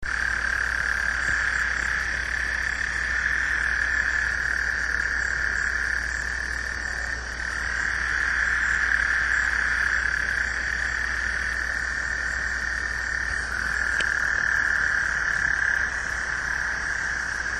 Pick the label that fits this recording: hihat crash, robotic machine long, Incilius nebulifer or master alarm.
Incilius nebulifer